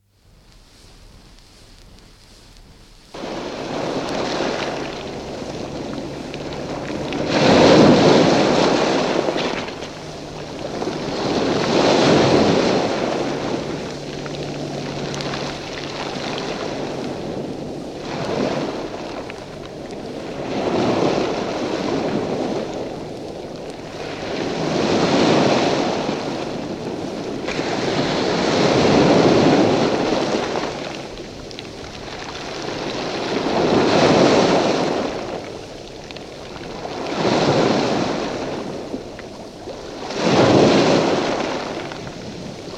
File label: Waves on shingles, from 78 disc; Ocean; Nature; BBC